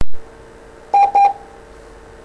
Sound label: Morse I